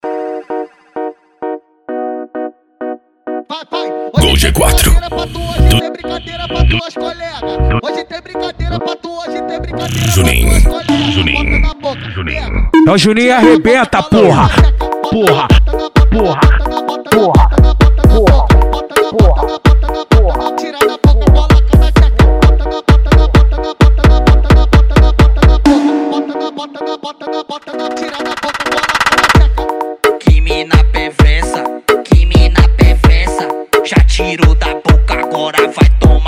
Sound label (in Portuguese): cd funk automotivo